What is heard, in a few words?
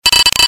blade hit, helicopter vehicle